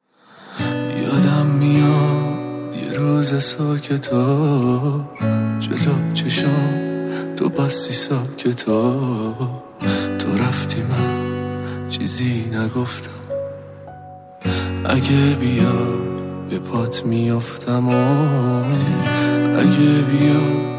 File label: Guitar Version